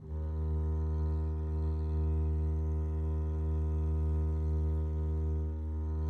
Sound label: eb2 down, arco looped; meatbass